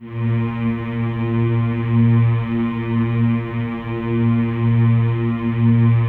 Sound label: choir A#2; Spectrasonics; Symphony of Voices